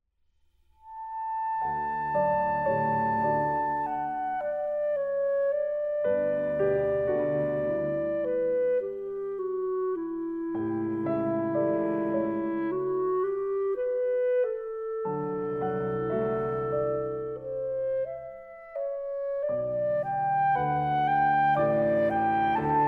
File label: Clarinet, Clarinet Trio in A minor; Clarinet Trio in A minor Op.114 - II. Adagio